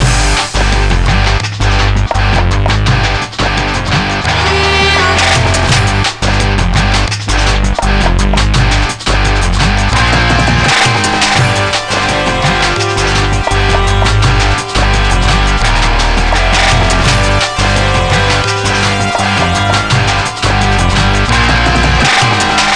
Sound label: Tabla Grunge